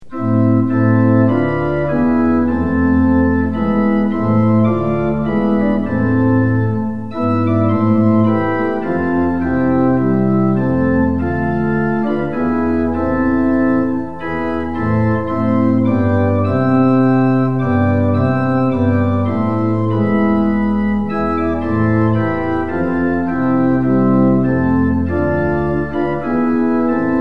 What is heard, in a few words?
tune; hymns; lillenas